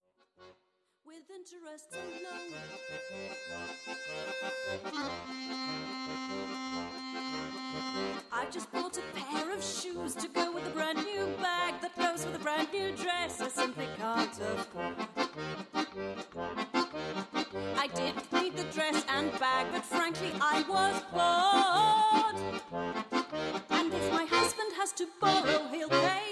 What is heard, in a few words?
accordion